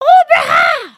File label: come here, emotes